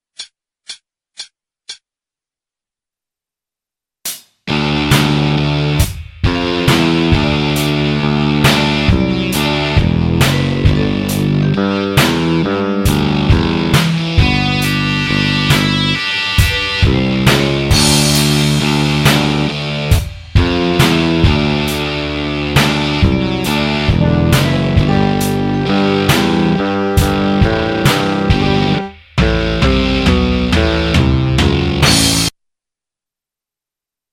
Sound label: chorus all 68